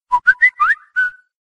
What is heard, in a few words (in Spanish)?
Silbido Whatsapp